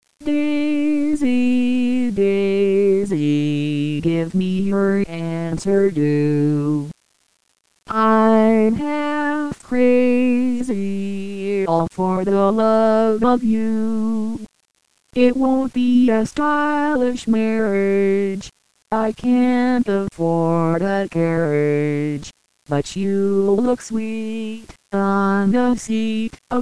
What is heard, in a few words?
Bicycle